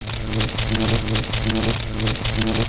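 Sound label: Cockpit damaged; PC Gamer IT CD 47; USAF; USAFDEMO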